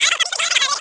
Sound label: Talk